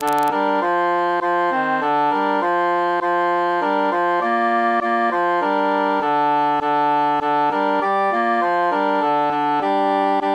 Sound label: altus mute